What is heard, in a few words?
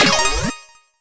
sfx token